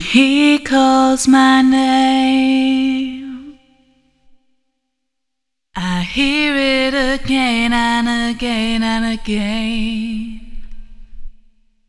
Vocal 3 way EQ; Conquering EQ

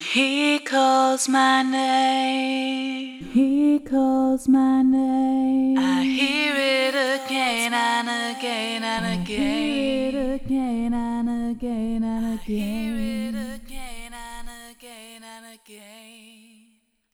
Vocal extreme EQ anomaly notched; Conquering EQ

